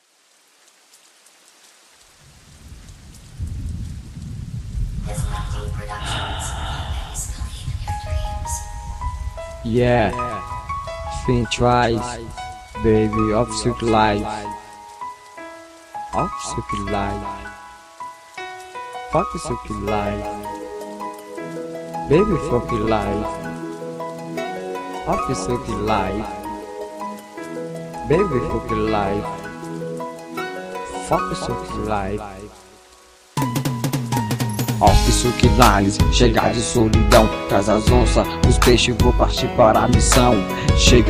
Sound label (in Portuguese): voz do rap